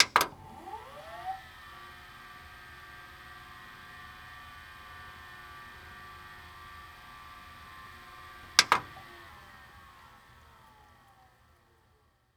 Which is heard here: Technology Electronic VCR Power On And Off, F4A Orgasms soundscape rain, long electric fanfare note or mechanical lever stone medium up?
Technology Electronic VCR Power On And Off